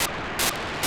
D-Star ONE Lightsat 435MHz700 4k8 GMSK Mobitex, satellite; On May 18th 2023 around 14:55 UTC Daniel DL7NDR received on 435.700 MHz the 4800bd GMSK MO